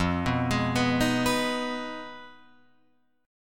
F Minor 7th Flat 5th, Guitar, Open F; Fm7b5; arpeggiated

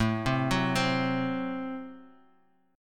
F sharp Diminished A

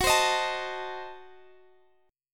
Eb Diminished 7th Gb

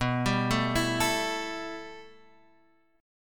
B 7th Suspended 4th Sharp 5th, Guitar, Drop D

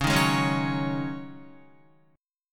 Db Suspended 2nd Suspended 4th, Guitar; 8,6,8,6,6,9; 4F,4A,5C,5G,5C,6E; strummed

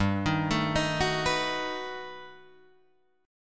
G Augmented Major 9th, Guitar, Drop D

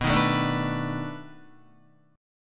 B 7th Sharp 9th B, Guitar; strummed

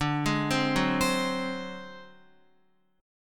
D 7th Flat 5th D, Guitar; D7b5 arpeggiated; JGuitar

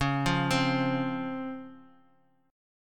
C sharp Major 7th C sharp; arpeggiated; chord

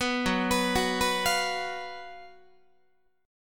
Gb Major 7th Suspended 4th Gb Guitar

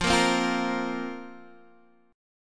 A sharp Suspended 2nd F

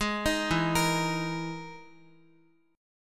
E-Major Sharp 11th E, Guitar; EM#11 arpeggiated

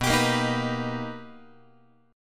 B Augmented Major 7th B Guitar, chord; B+M7; strummed; JGuitar

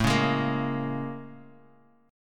Bb Minor 7th A; Bbm7/A strummed

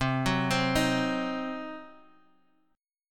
C Diminished, Guitar Half Step Down, chord

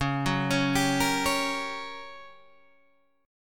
G Minor Major 7th Flat 5th, Db

